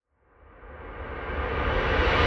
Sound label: Reverse Impact; Cymatics; Orchid Sample Pack